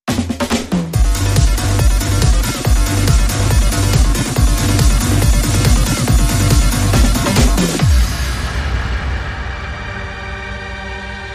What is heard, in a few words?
Techno Dub, Bumper